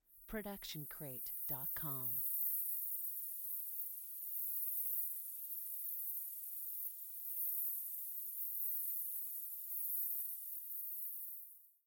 Magic Portal; SoundsCrate

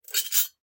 Glass Shuffle; SoundsCrate

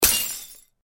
Glass Break; SOUNDSCRATE

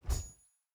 Sword Swing; soundscrate

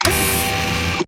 Robotic Movement; SoundsCrate

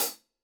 hi hat 14 inch; soundscrate

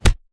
Fight Impact; SoundsCrate

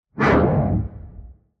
Fire Woosh; soundscrate

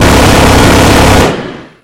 Machine Gun; SoundsCrate

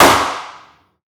Gun Shot; soundscrate

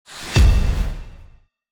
Epic Metal Hit; soundscrate

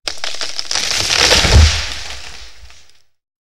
tree breaking; soundscrate

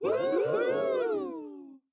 audience woohoo

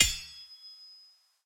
Sword Clang; soundscrate

